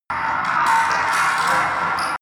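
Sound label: screech scrape reverb, Science Fiction; sciene-fiction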